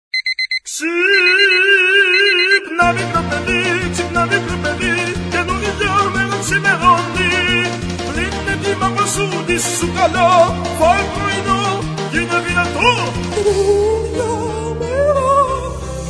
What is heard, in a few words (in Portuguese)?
cantor de opera